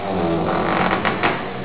door creak

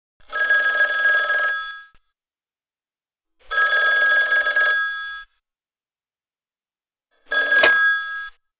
ans phone, telephone

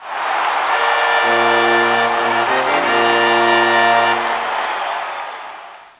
crowd cheers

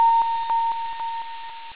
electronic ping

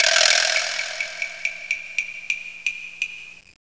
spy sound

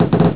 flashbulb

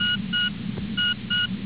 missle beep

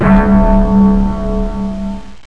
big bell

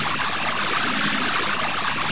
missile

